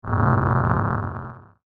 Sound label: Space Car Engine; science fiction sound effect; Andrew Fleming